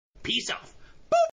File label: notification sound